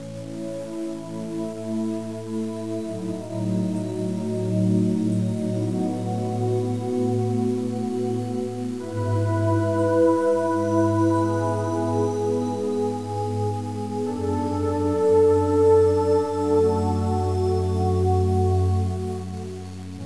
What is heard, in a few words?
healing spring; svencoop; adamr